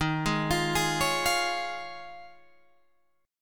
Eb 7th Suspended 2nd Suspended 4th Eb, Guitar Open G